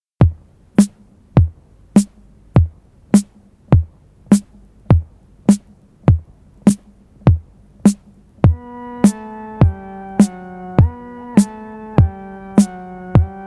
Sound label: instrumental, synthwave